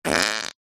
cartoon fart quick unexpected

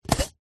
coffee cup disposable plastic lid remove; food drink

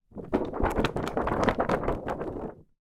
paper pack wobble

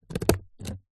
stapler pocket sized single staple cardboard; office

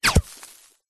warfare bullet hit ground dirt; zapsplat

